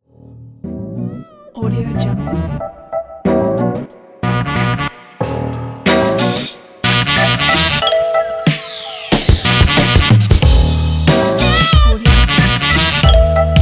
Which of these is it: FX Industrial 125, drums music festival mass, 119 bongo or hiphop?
hiphop